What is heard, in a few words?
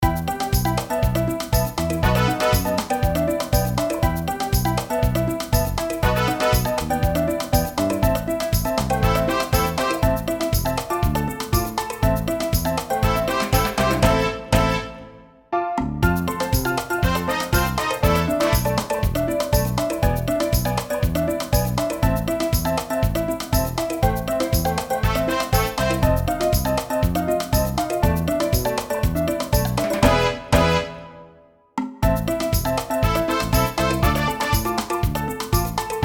salsa